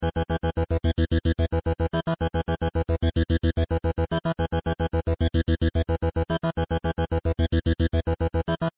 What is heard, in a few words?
synth bass